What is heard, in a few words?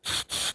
spraypaint; midnight guns